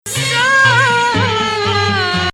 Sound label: Nahawand